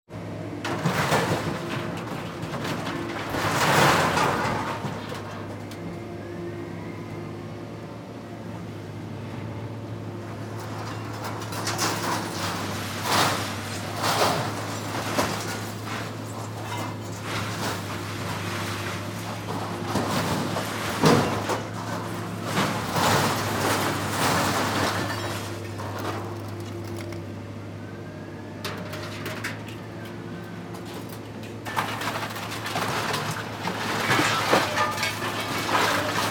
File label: scrap yard press